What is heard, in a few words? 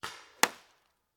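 Paintball Gun Impact; Sound Ideas; Guns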